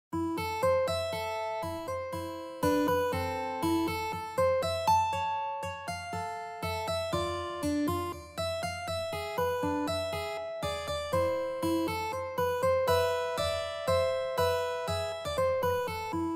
Hevenu Shalom, mandolin

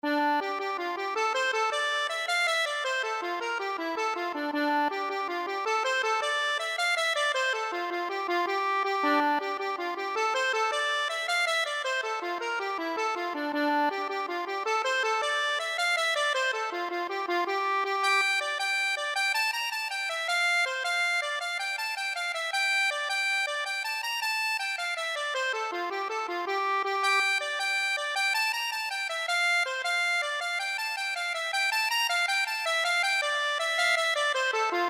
get up early, accordion

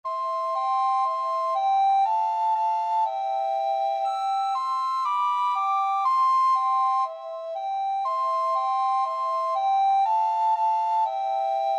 old macdonald, recorder duet

